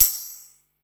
PERC, Drum & Bass, 100 BPM; Ueberschall; Drum & Bass Resonance

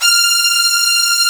F 4, VIOLIN SECT; AKAI S6000 CD-ROM - Volume 1; Strings

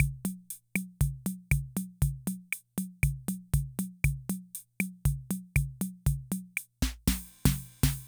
Loop, rhythm 55, classic machines

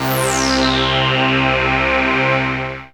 synth, c, Sweep Synths; Dance Synths

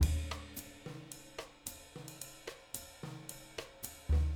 110 LATIN, Living Drums; Sampleheads; Peter Erskine; JZ 110 LATIN

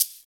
Shaker; Equipped Music; Loungin House

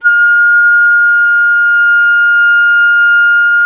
FLT, WOODWINDS; Roland - LA Composer vol. 1

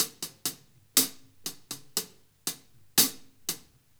New York Loop, Drum Tools, Laidback; Sonic Foundry (Sony Creative Software); NY Loops

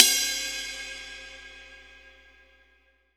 RIDE, Percussion; MARKYDRUMS

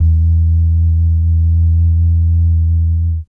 bass dums; Spectrasonics Vocal Planet; Jazz FX

